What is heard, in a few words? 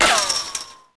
wireframe hit, bullet hit; projectiles; Max Payne